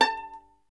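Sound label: STR violin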